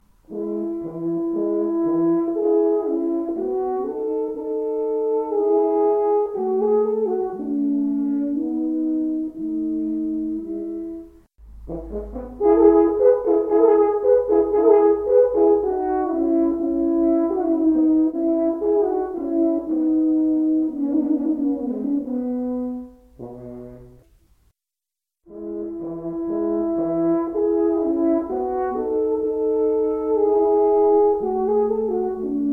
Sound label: adagio, 2 horns; Stich J.V.: Duet Nr. 8Adagio